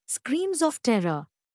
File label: screams of terror; English; SpellingActivity